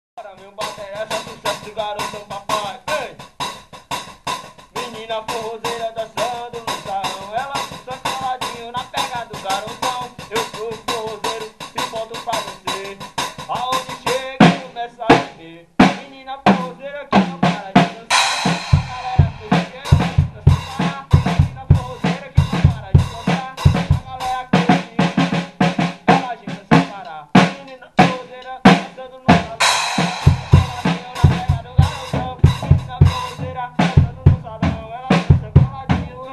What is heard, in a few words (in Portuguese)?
forró